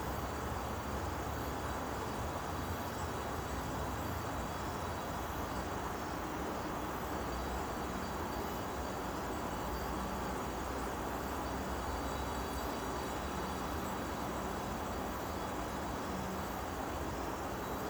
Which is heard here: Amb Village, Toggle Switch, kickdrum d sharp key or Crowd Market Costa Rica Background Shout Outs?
Amb Village